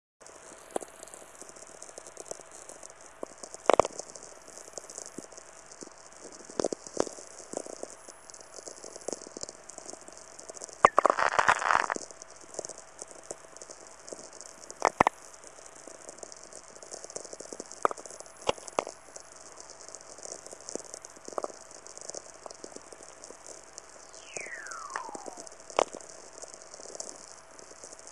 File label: whistler shower, radio; radio circuits; McGreevy; fish rock road